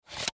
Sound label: Cd Scratch Remove, Cd Scratch Remover Remove Bottle; Cleaning; Offices